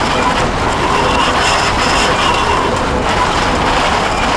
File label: roll tin roof; terrains; Tony Hawk's Project 8; XBOX